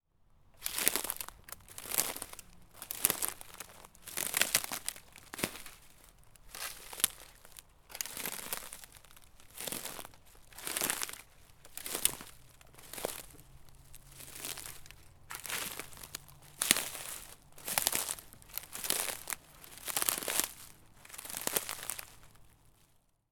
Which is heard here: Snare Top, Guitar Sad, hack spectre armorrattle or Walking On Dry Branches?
Walking On Dry Branches